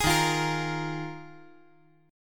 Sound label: Dsharp Suspended 2nd Suspended 4th, D#sus2sus4 chord; strummed; JGuitar